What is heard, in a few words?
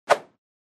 Motion Swish